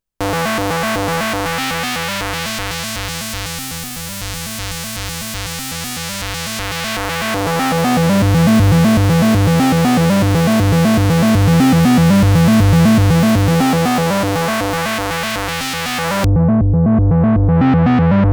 Filter Modes

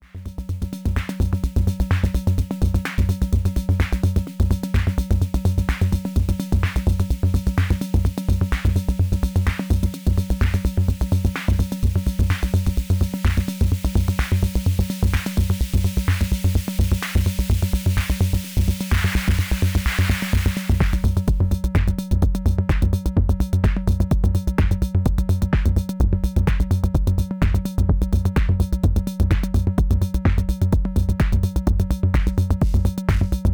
House

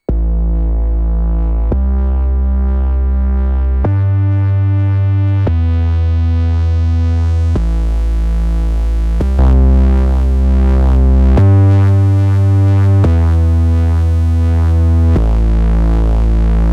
monologue jam

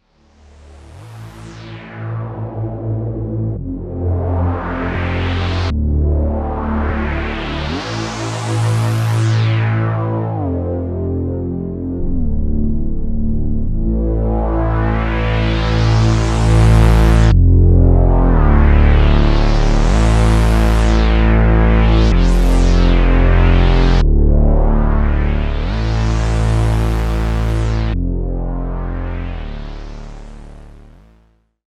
Wavetable Bass; Ableton 10

